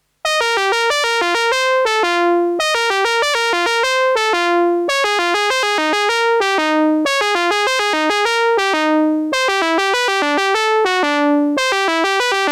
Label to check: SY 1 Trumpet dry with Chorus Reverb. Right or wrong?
Right